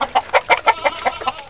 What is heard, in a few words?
SOUND CHICKN; PC Gamer 4.2; mtm2demo